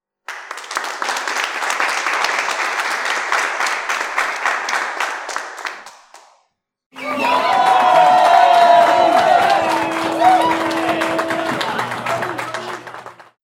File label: Applause Crowd Cheering; audience cheering sound effect